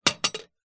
household door letter box metal flap; zapsplat

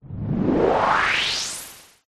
magic wand whoosh; zapsplat

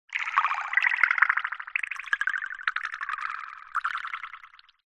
science fiction warped water drips; zapsplat

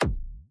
impact thud hit hard rubber

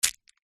food chocolate small foil wrapped catch in hand; zapsplat

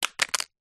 household mobile cell smartphone drop hard ground

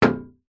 impact metal knock hollow high pitched

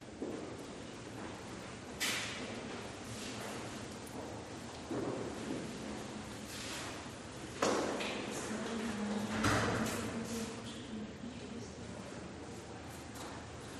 kremlin church ambience loop; the sound pack tree

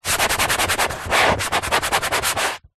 household large inflatable chair fingers rub

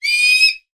animals bird hawk ferruginous chirp squawk; sound effects one

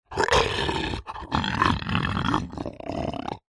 horror monster playful growl breathy

